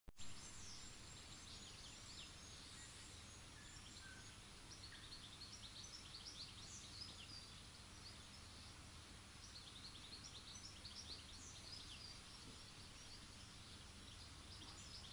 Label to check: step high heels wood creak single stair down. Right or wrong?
Wrong